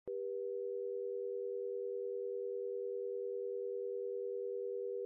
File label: afraid to dial a number; sound generators and happy little accidents